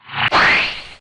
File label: Skill Energy Strike